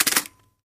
Antique Camera Shut, shutters; shutters & movement

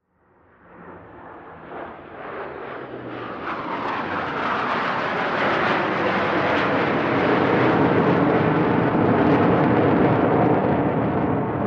F/A-18 flyby

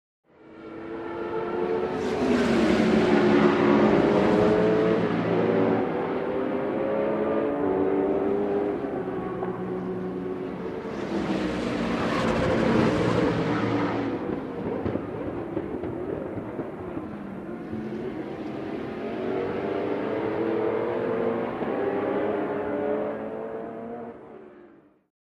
Sports Car By Dist

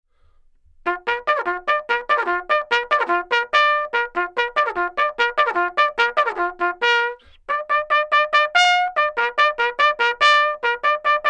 Bugle Plays Reveille, bugle civil war

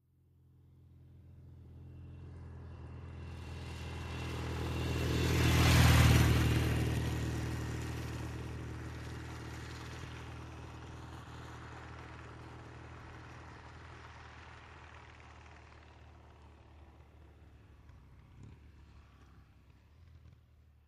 Motorcycle, Norton, By ( 35 Mph ).

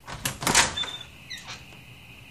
Door Front

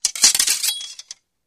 Group Draws Salutes, swords

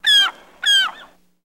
Seagull Cry Generic

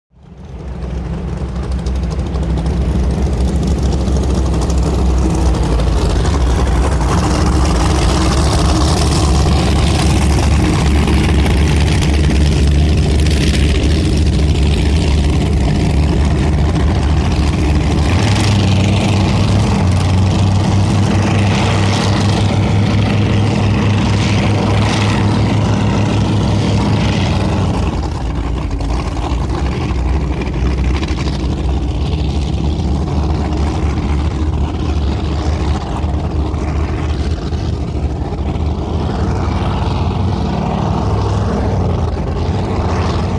Power Boat Idle Away, off shore power boat

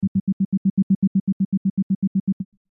Beep Door Beep Tone; beeps

